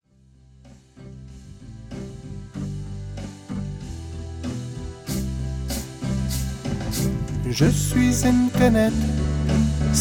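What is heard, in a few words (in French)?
canette trop chouette, Version vocale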